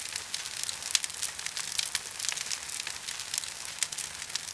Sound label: fire; cstrike; de dust thunder